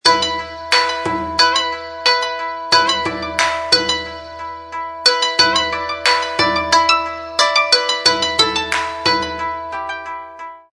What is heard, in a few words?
Pentatonic, Ringtone; HTC Smart